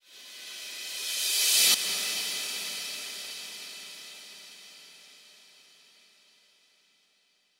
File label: ReCrash 138BPM; Sound FX; A#minor; Vengeance Samples; Fusion Kit